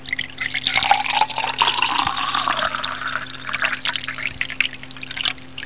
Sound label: GLAS; 999wavs